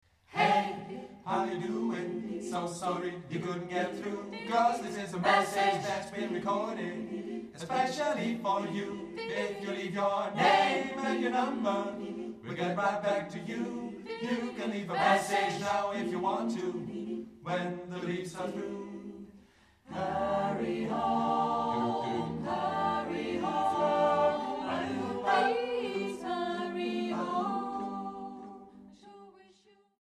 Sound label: ssatb